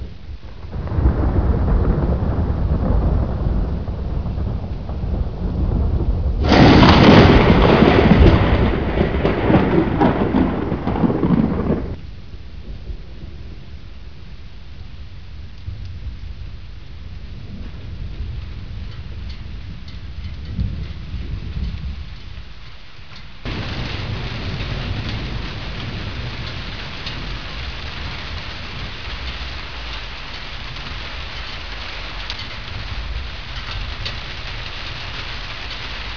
Rain, 雨声; 自然; 特酷声音素材库

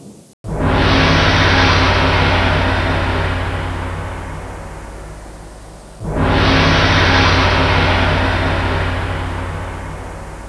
Joy, happymusic; 特酷声音素材库; 背景音乐

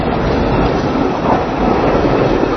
Streetcar; 暴力赛车

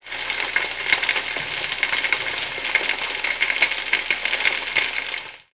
Water, 水声; 特酷声音素材库

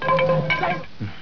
Fight; 特酷声音素材库

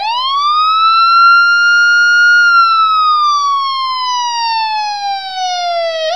Police; 暴力赛车

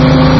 V8 Sample; 暴力赛车

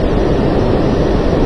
Airduct; 暴力赛车

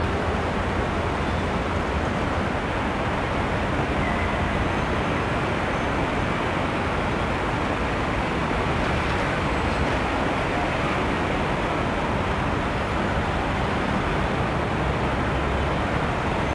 city; 暴力赛车

